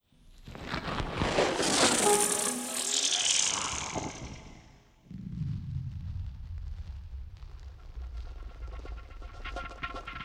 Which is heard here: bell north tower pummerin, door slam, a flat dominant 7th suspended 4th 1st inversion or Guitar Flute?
Guitar Flute